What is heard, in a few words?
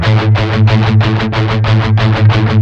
16TH A#2 R, Guitars; Spectrasonics; Hans Zimmer Guitars VOL-1; MED 16TH